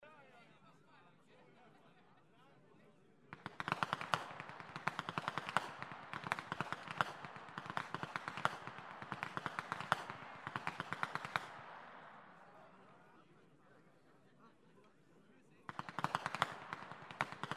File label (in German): schnalzen, flachgau; STEINDORF II